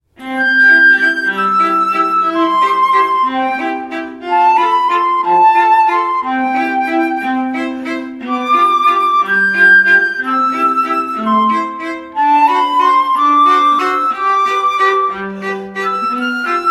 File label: Trio Music, Wind And Wood